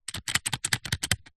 office chalk sticks pack shake

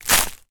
foley footstep bark chippings

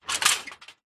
industrial cart metal heavy bash or set down

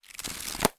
diary small paper pages flick through; foley

